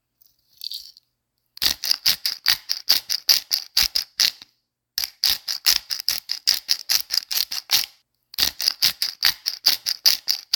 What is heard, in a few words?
a song without background noise